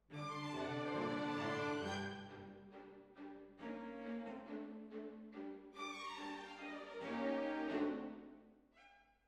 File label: Hall